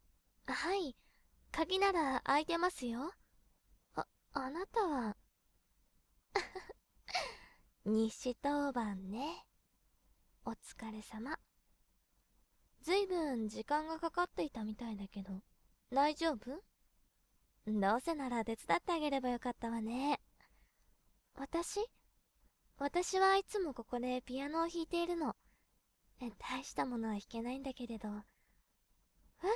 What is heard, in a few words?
piano